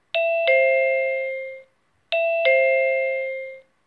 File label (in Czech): melodie